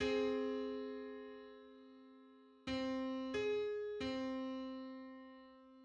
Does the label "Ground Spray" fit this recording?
No